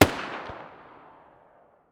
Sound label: muzzle spas12 mono far